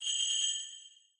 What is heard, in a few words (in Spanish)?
pandereta